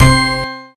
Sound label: in respin chance; Amatic; Wild Respin